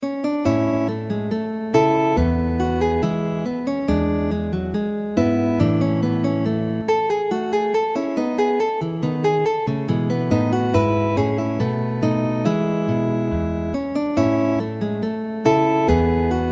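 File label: cello suite, bourrée; bach; suite n 3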